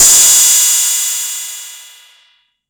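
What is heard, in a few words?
TR606 Cymbal OD; Roland TR-606; drum machine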